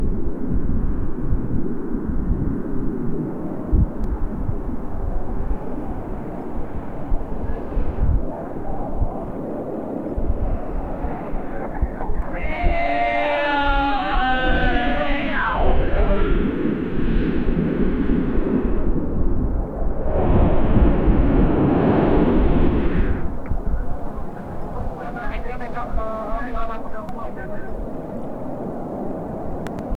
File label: fly from high above where, dancing